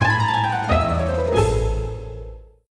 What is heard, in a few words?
candy crush fail